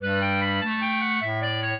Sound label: clarinet; Mozart